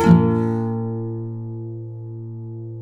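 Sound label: nylon guitar up major B, flam chords; Spectrasonics; Hans Zimmer Guitars VOL-2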